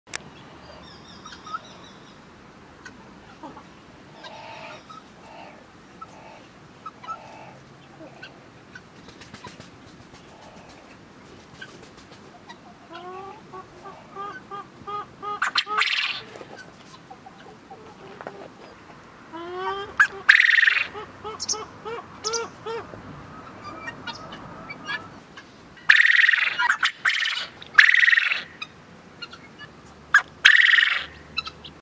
bildircin sesi erkek bildircin otusu

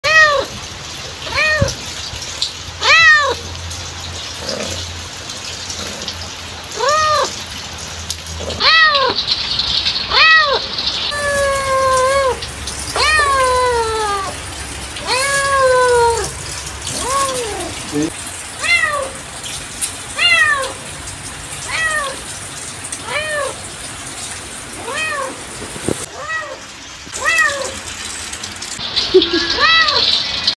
Gerçek kedi sesi kedi sesi kedi çağıran ses

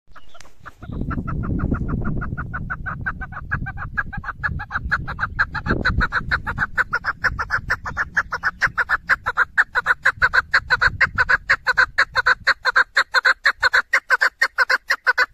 disi keklik sesi, female partridge; perdiz; rebhuhn

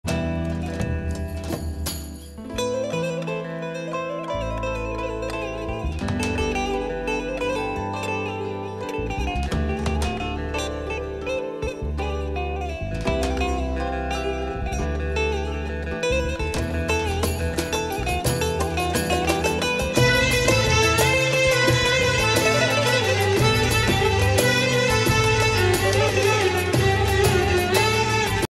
saz calmaktir

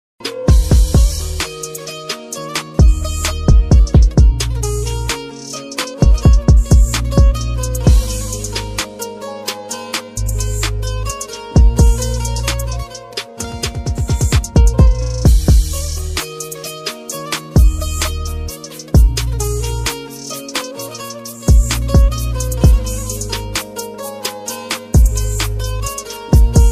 telefon zil sesi turku

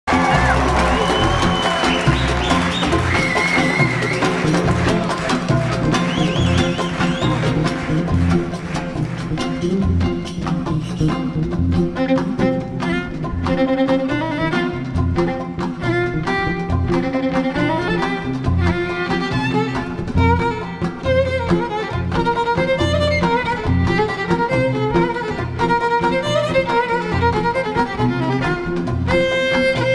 muhtesem keman solosu

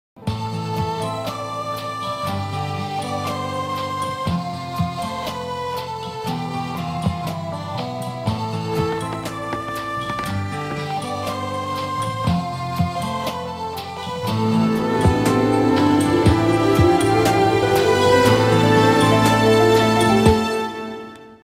dram temasi; bez bebek dizi muzikleri

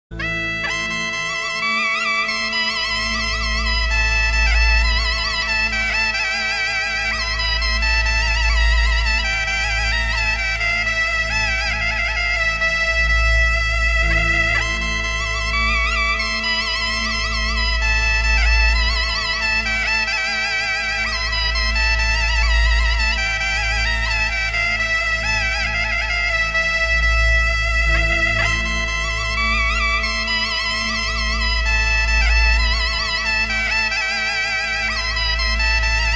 zurna; acem kizi

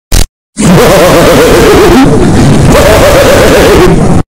gulusu bass boosted; recep ivedik

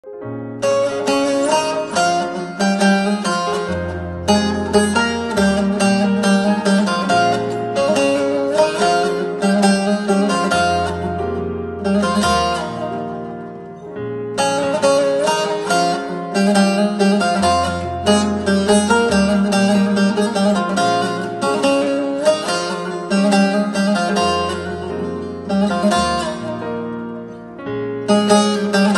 saz versiyon; galatasaray; mauro icardi

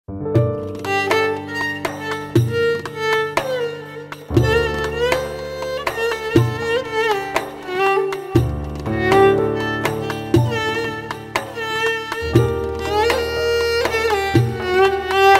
keman

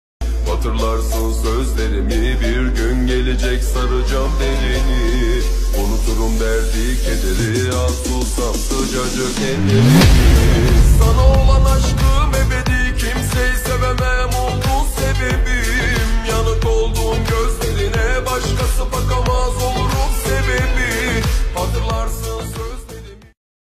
duygusal

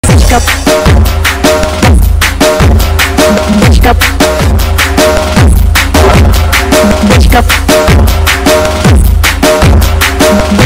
BASS ROMAN HAVASI

